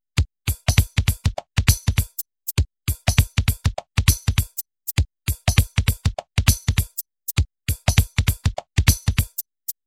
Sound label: drum pattern